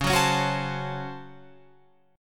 Db Major 7th Suspended 4th Sharp 5th, Guitar, Open F; strummed